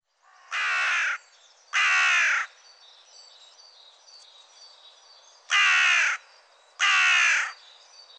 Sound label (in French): Corneille noire; Le cri de la corneille. Son cri est rauque comme une voix cassée.